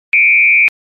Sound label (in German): triller